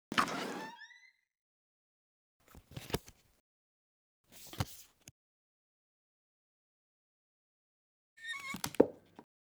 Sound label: Filling Box